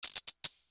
Switch Settings Menu

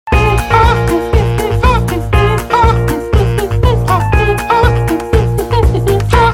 Ringtone

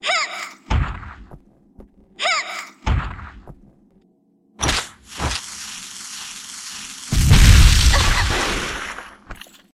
Barrel Bombs

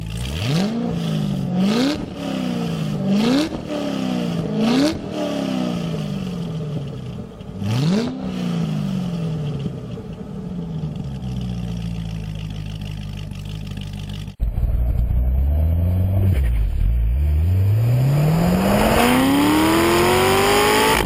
Corvette ZR1

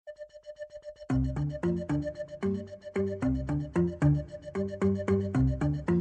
New Alert

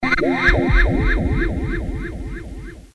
mystery notification

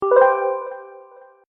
Bells; Rotuma

